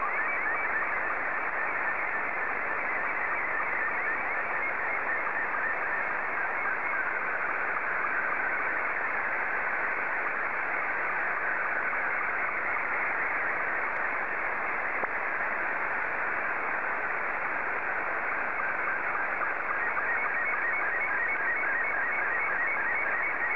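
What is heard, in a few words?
SSTV, Scottie 2; JA3QJQ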